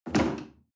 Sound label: chair wood take hard